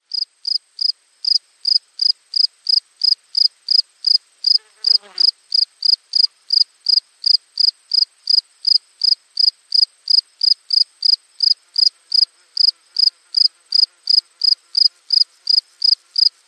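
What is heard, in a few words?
Crickets Loud Chirp Close Up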